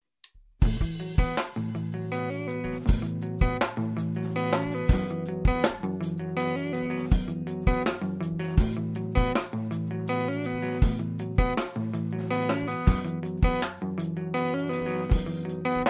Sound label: math rock riff